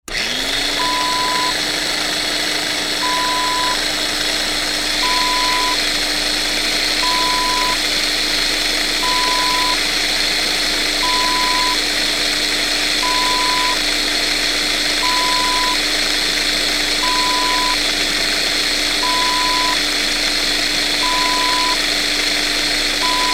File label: food processor